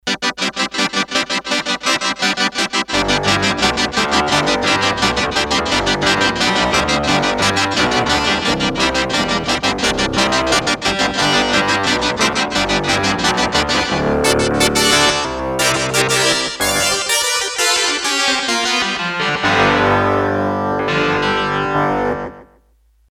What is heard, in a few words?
Korg DS-8; Factory Patches; Mr.Mean E